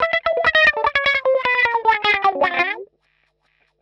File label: wah grind; PowerFX - Nu Jazz House